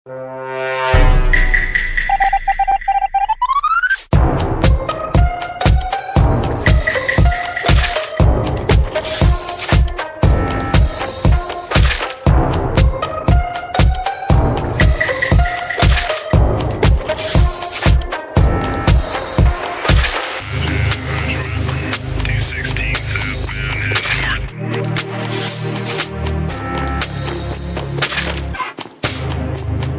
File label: ringtone; among us